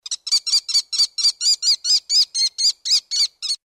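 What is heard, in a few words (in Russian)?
звук воробьиного ястреба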